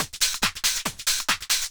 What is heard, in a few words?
Loops 140